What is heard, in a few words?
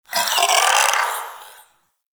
Shadow Vocal Idle; Warframe; Duviri